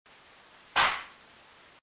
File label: loud pop while sleeping; paranormal